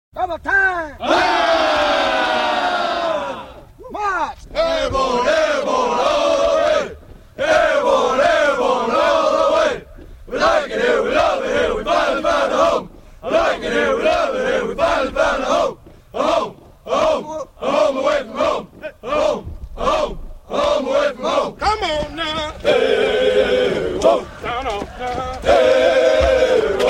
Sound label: Airborne Cadence